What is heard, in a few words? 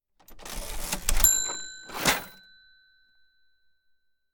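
Open Close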